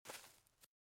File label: SFX step grass; out of reach treasure royale 2020 gamerip pc